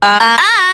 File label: "ah ah ah" male tones; Voice; ooh ahh